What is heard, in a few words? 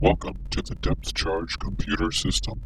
Start Windows